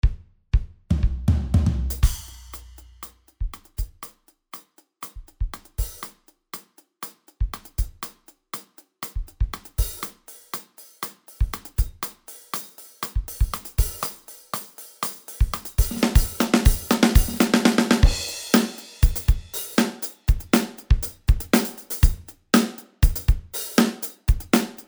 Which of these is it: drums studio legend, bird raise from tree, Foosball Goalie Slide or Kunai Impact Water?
drums studio legend